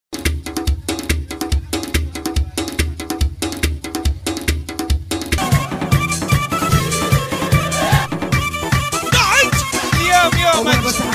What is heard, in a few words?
Kurdish dance